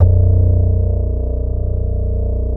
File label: ESQ 1 E-BA, BASS; Soli-Music; World of Synthesizers VOL-1